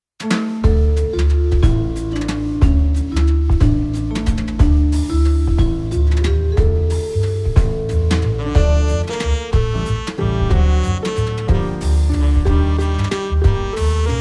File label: jazz sus